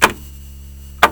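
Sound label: cassette tape eject; Sharp MZ-80B&2000; Neo Kobe emulator pack; EmuZ2000TF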